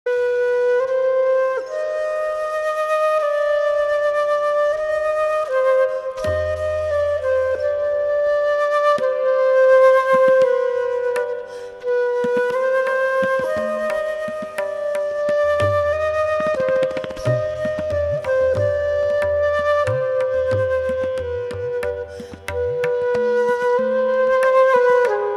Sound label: Raga Bhairav